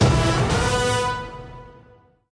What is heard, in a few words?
small Win Autoplay; victorious